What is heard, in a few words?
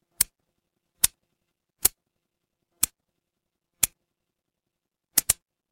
mechanical switch